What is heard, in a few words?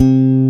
C2 STRAT, Bass Guitar; AKAI S6000 CD-ROM - Volume 1